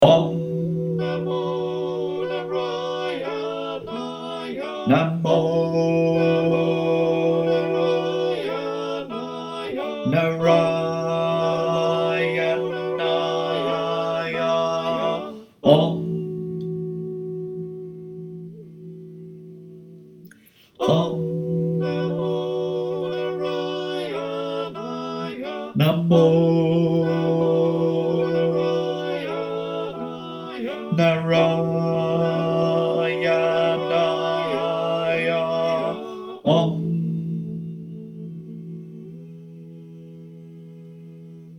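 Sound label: bass, choir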